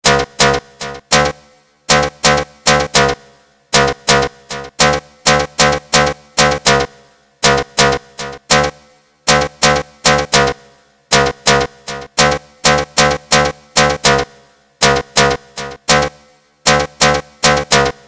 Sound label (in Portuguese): BEAT FLUXO MODINHA